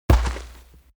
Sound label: creature coloss step